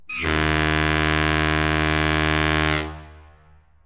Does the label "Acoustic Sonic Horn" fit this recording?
Yes